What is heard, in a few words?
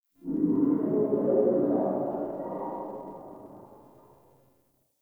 Exterior Distant Calls Scared, Ambience